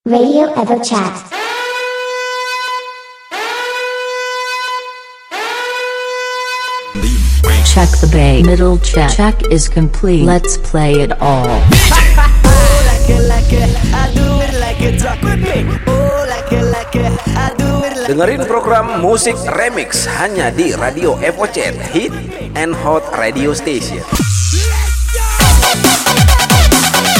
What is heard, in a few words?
Music Remix